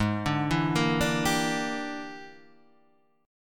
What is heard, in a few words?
E 7th Sharp 9th Flat 5th G sharp, chord